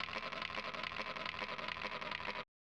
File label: Dice; Kabuki-chou Reach Mahjong Tonpuu Sen; SNES